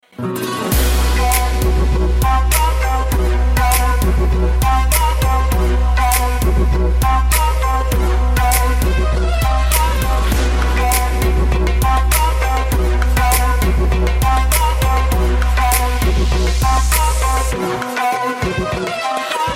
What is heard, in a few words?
trap oriental